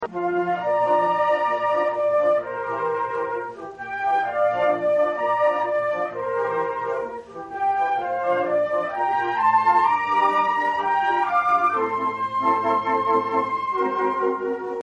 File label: concert, flute; Newyear concert 2015